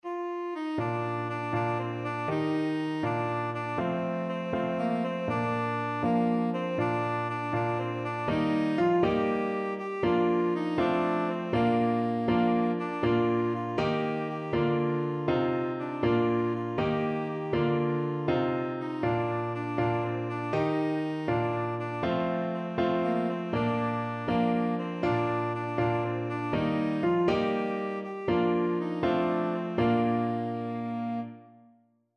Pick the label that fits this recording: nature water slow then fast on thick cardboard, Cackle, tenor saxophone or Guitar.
tenor saxophone